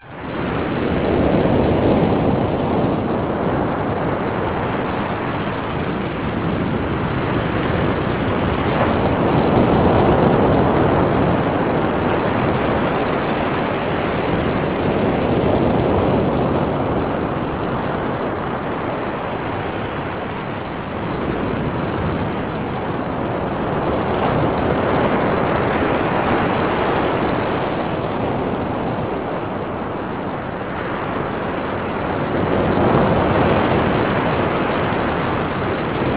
waves; Sensorium; kawasaki

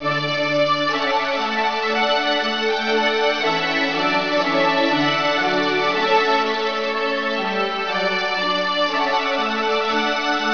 trumpet

